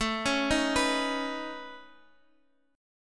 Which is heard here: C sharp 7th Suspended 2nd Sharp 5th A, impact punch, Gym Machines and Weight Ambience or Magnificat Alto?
C sharp 7th Suspended 2nd Sharp 5th A